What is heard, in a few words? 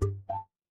ui alert